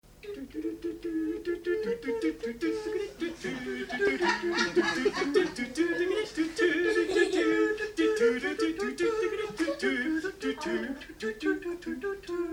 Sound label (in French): Orgues